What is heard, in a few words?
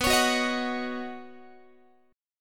B Major Add 9th B Guitar; strummed; x,19,16,16,19,17